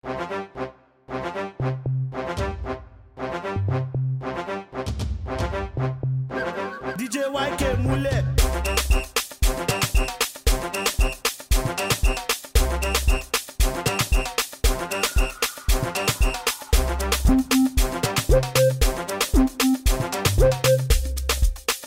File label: Happiano Beat